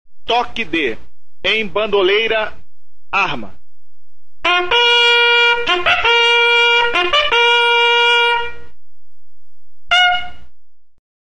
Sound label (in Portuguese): Em Bandeira arma, Toques de Corneta